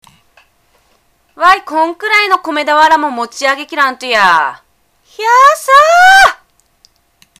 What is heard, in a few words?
hyaa